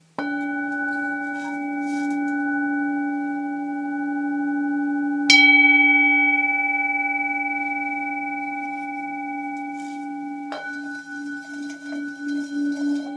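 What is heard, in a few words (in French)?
thick bol note RE, bols chantants tibétains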